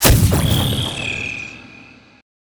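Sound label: ground impact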